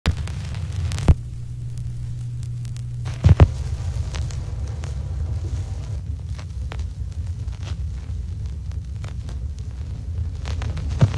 crackles, Vinyl

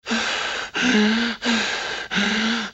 breath

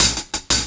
stutter hat, drum loops

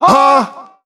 "huh"

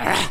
growl male

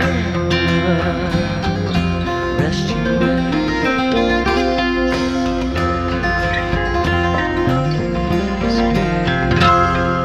guitar irish; Loops

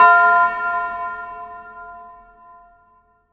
bell; Bells

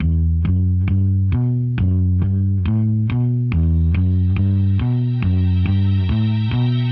bass, Loops

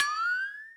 twirly, Bells; Misc Percussion

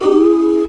oooooooo, Voice; Notes

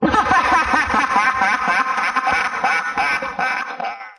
laugh

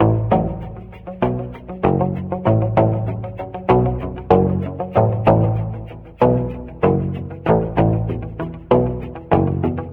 keys

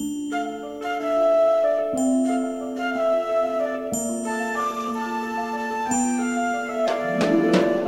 flute, Loops, Sad

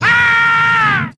scream male; ooh ahh

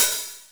Hats Real Open

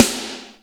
poots, snares real

